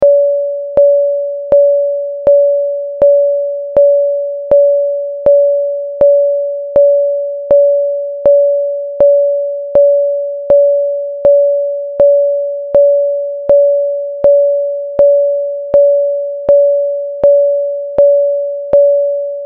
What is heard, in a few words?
Single Chime